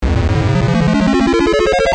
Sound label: warp; CRYSTAL